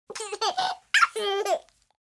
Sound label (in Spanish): risa sonidos agradables